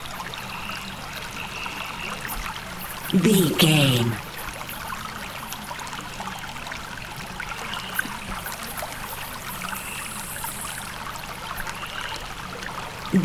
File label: river stream daytime flowing water insects birds loop